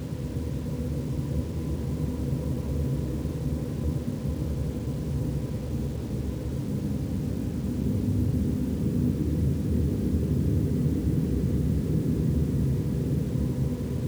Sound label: alien drone; atat